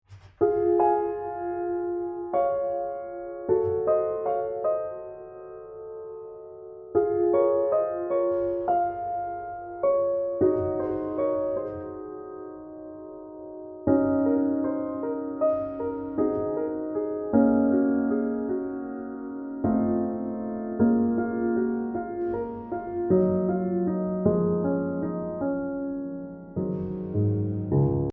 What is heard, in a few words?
Steinway; 1928